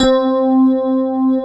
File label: FM ELP C3, Keyboards Old School; USB Soundscan vol.09; AKAI